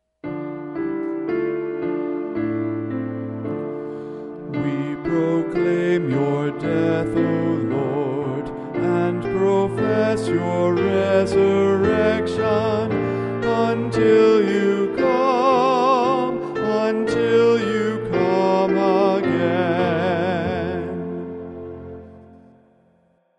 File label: Heritage We Proclaim melody